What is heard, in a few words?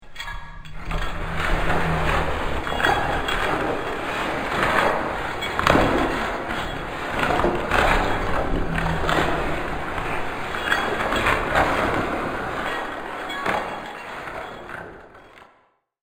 Orc Workshop; Evil Sound Effects; fantasy sound effect